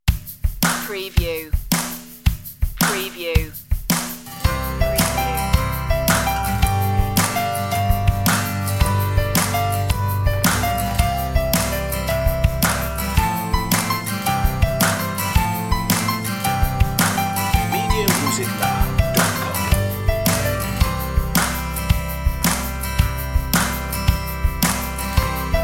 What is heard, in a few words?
pat a cake instr; child